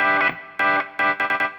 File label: guitar, Emin; Big Fish Audio - Hardcore Breakbeat Action; Hardcore Breakbeat Action CD1; 07 Testify 150 Emin